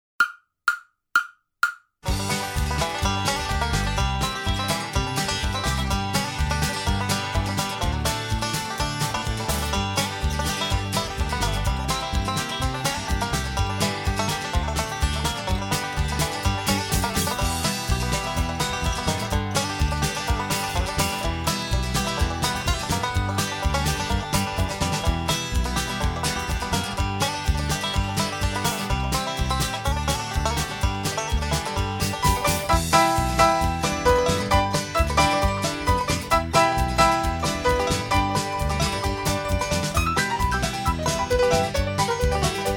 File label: 126 BPM 32 bar Bluegrass Fun Speeds up